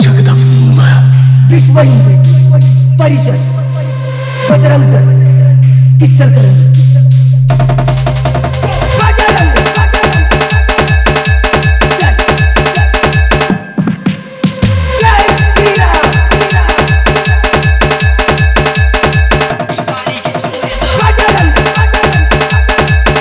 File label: Dj Remix Song; Bajrang Dal; Competition Song